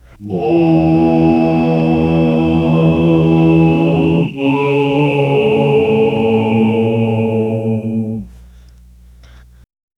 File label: epic viking rowing chant; warfare